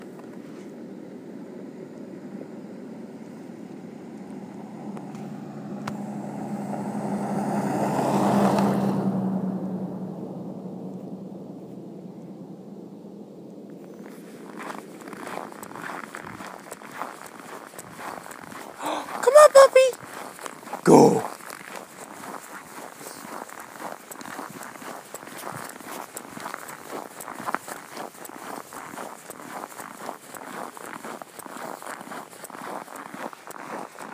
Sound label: Car followed by walking